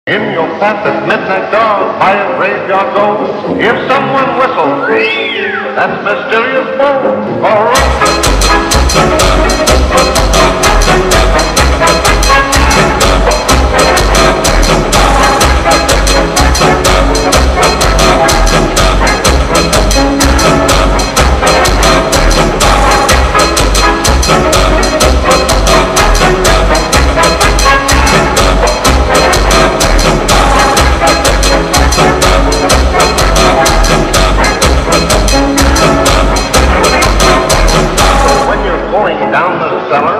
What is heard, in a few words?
funk